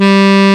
BRASS G1

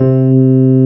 suitcase; Keyboards Old School; USB Soundscan vol.09; AKAI

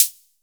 HI HATS, DRUMS; Music Store Sound Service; Einstein

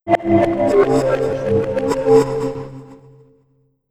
SREV PERC, REVERSE FX; USB Soundscan vol.60; Asian Traditions; AKAI

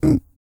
Vocal, Blues & Soul; CUICA MAN

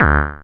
Anasound Short mid D; Sonic Foundry (Sony Creative Software)

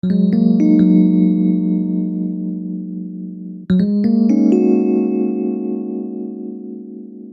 Keyboard, Soul

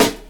KIT, Dance Mega Drums, HIP; Best Service

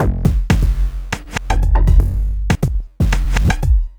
Subtractive Beat

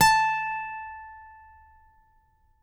ACGT A5, ACCGTR PICK

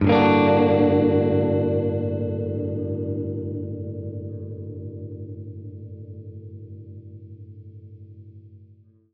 Gretsch Chord Gmin9[maj7], Guitar Chords; undercover samples

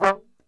G 1, Brass Super Section, TRMB SHOT; Best Service; 90 s Sample CDs

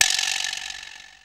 VIBRA; Hollywood Edge; The Big Bad Beatmachine; XR-10

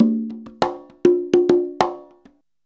CONGA, Modern Percussion Loops

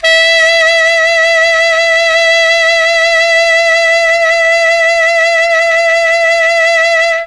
E3 ALTO SAX, Mellotron; Mike Pinder - Mellotron Archive CD; CHMB ALTOSAX

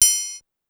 Triangle, Pitched Up; Clavia DDrum-AT

